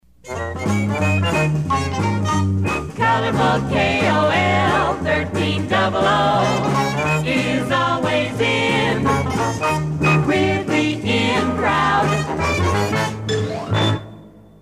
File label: crowd; kolin